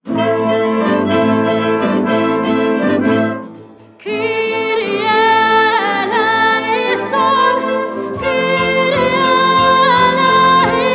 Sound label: kyrie, coro